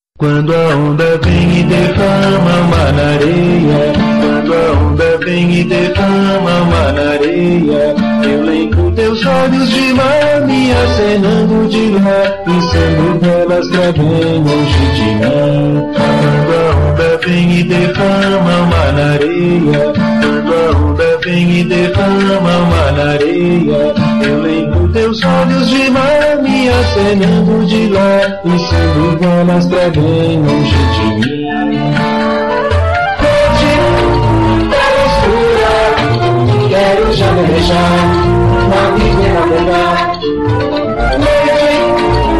toada

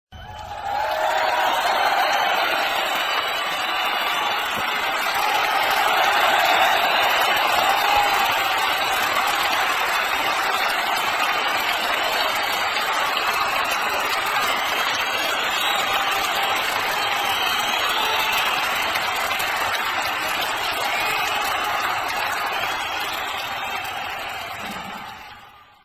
aplausos publico

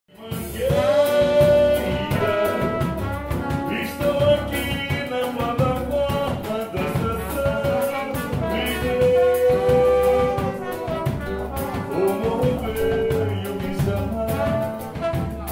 piano

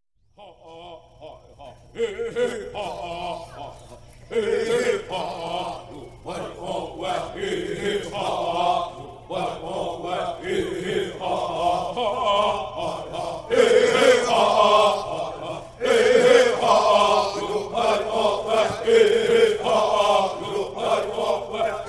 Canto da madrugada

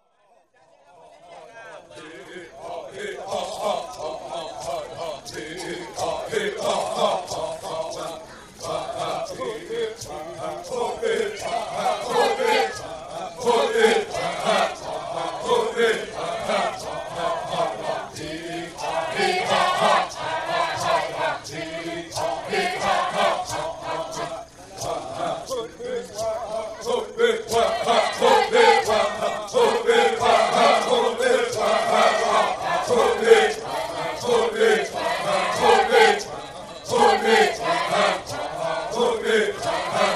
Canto da furacao de orelha

